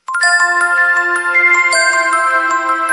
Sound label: Sweet Bells Sms